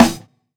Snares

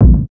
KIK; Kicks

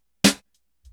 SNR, Snares

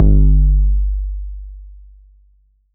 808's

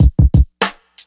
Loop

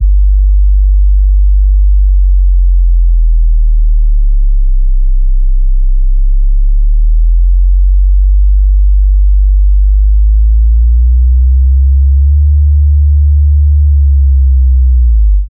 Sub, 808s

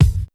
Kicks

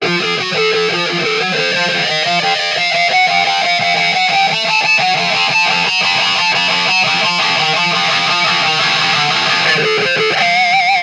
Loop

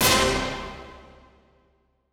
Hits, FX

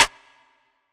Open Snares

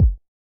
Not Nice Kicks

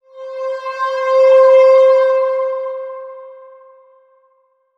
Pad

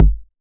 808's

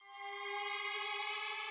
FX

